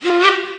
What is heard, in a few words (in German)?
D Pfeife 2 kurz